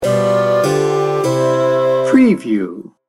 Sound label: frere jacques sting bumper